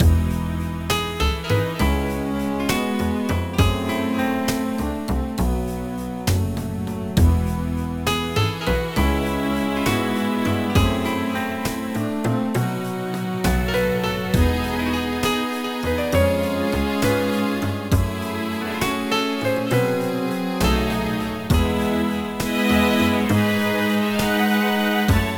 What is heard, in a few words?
Slideshow music